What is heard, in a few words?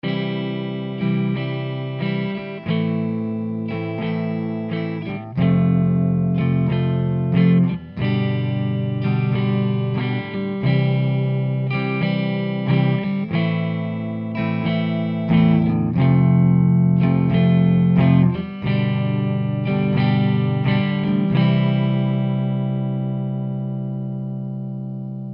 rock guitar